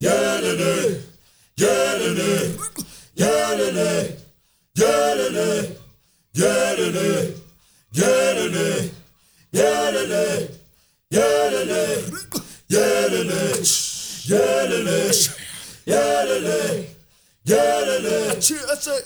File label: YEAH LE, CHOIRS; Spectrasonics; Heart of Africa 2